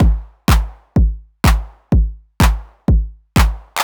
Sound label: drum ninety pop stripped 125, Drum Loops, Classic Chicago House